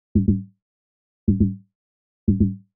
Tom Low; VariBreaks; hydrogen

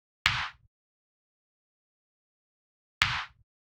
Clap, 87 bpm; hydrogen

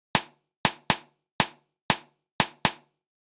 Stick; hydrogen

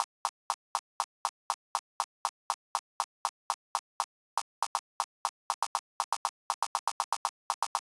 Click; hydrogen

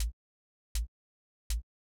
Hat Closed; hydrogen

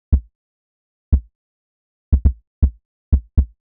Kick Dist; hydrogen

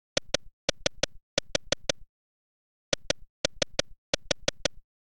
87bpm Zap; hydrogen

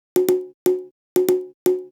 Conga Hi; hydrogen

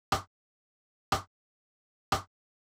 Ragga Rim; hydrogen

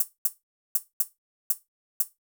VariBreaks Hat Pd; hydrogen

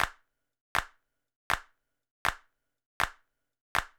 Hand Clap; hydrogen

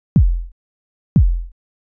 kick; hydrogen

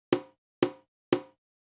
Conga2 Lo Mute; hydrogen

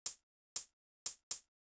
VariBreaks Hat Cl; hydrogen

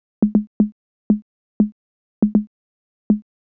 77 tom hi; hydrogen

